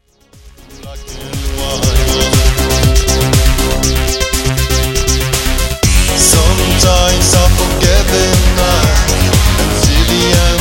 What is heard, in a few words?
electro freak